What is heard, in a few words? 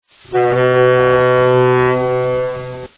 Boat Horn